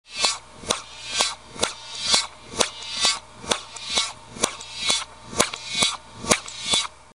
ball

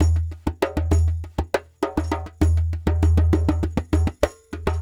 Lg Dumbek

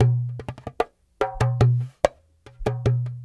Dumbek

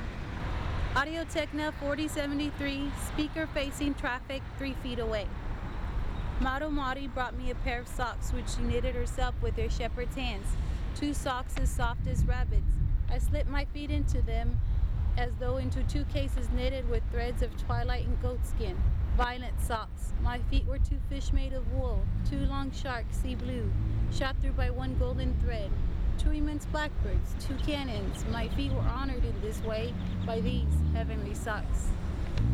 AT4073 3ft, outdoor

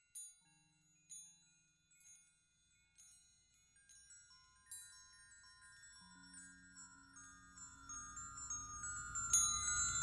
wind chimes

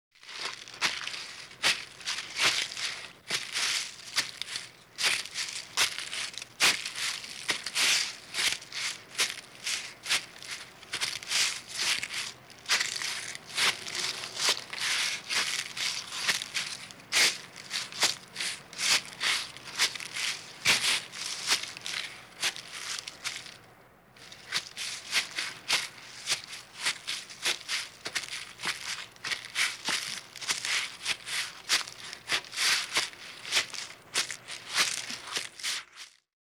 one person walking, Grass and Dirt; Butterfinger Video Sound Effects

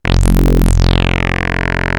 micromoog

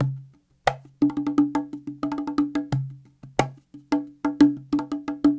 Cahon

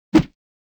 swish single; Asian Show Sound Effects

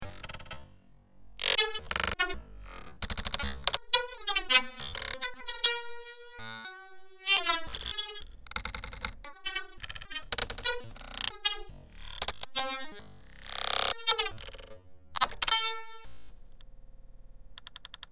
keyboard

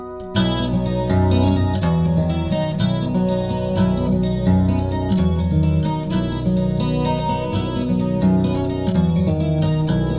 happy song

